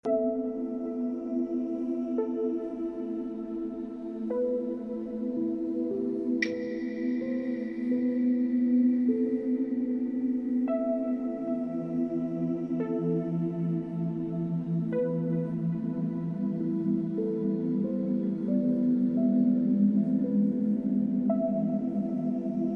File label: song; buropath